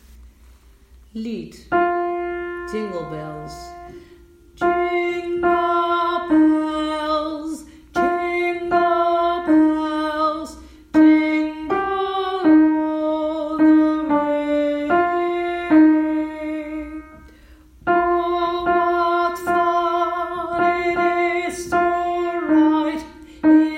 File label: Lead jingle Bells